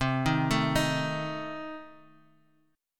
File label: C Minor 6th C Guitar Open F; Cm6 arpeggiated; 12,10,9,10,x,x